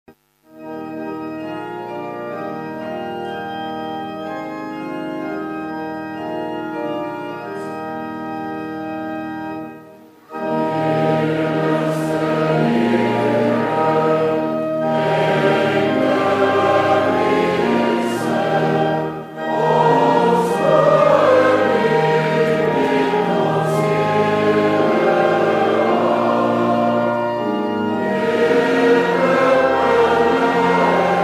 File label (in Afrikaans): Gemeentesang; Skrifberyming 12-3 vers 1, 2 (Geloofsbelydenis)